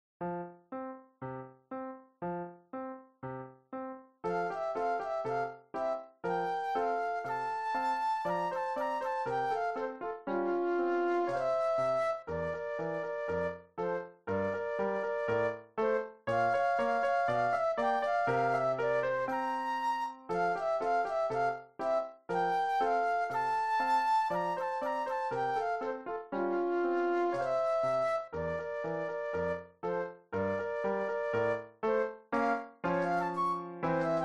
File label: Flute Solo; classical